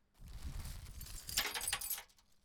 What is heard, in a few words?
Glass Shards